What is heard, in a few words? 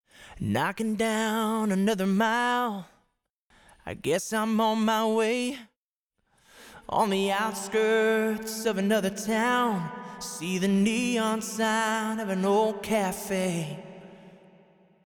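Vocal Large Hall; Reverb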